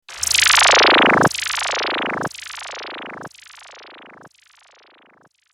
laser

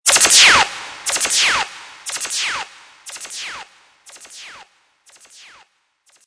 Laser; Stonelove; serato

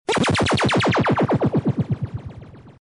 lickshot; Laser

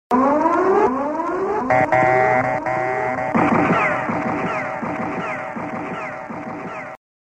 horn buzzer gun; Horns

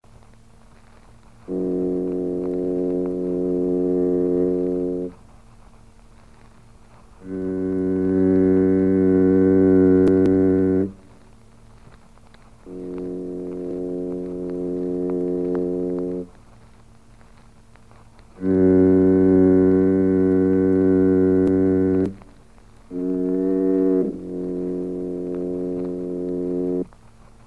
fog horn; Horns

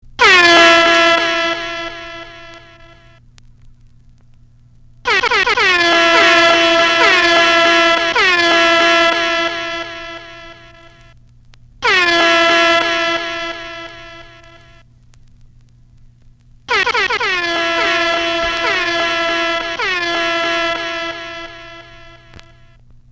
air horn; Horns

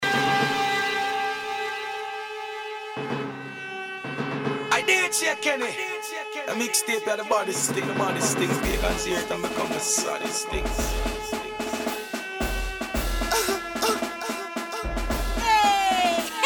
Instrumental; DanceHall

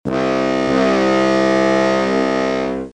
boat ship; Horns

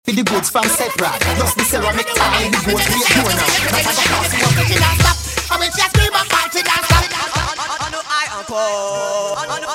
Thunda clap; Arawak Sound; DanceHall

